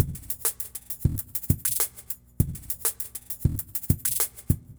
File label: Remixed Drums 100BPM; The Roots Drumloops